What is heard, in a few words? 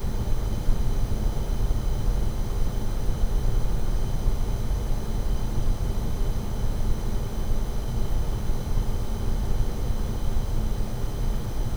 industrial steamy loop; midnight guns